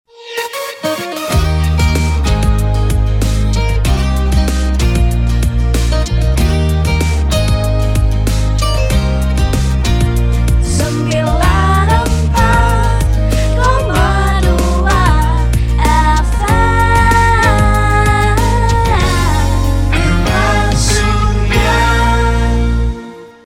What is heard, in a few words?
jingle long; rgsfm